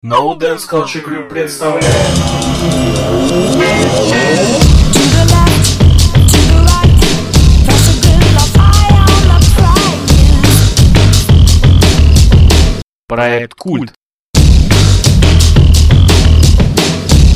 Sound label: jingle